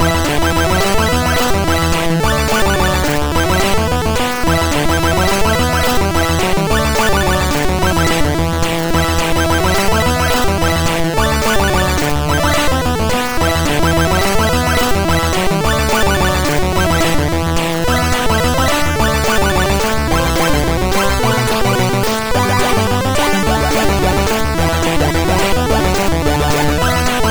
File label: Shoot em Up Gametune, MOS6581R4; sid; hvsc